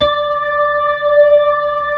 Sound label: B-3, Blues, Keys of the 60s and 70s; Roland LCDP10 Keys of the 60s and 70s 2